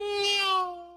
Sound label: neow